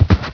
thud

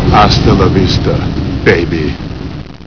alv baby

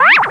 LAZER

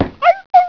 dog

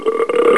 burp

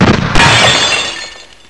SHOT

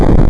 crash; QUAKE

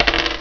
DROP

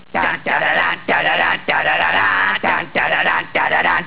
SING

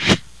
CAT

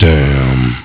PLYR DTH

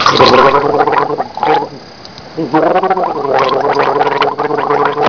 GURGLE

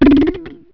WOODTWND; toon

